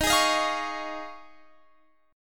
D sharp 7th Suspended 2nd Suspended 4th Guitar Drop D